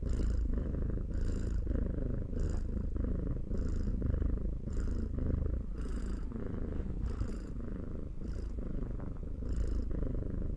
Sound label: processed purr loop; Cait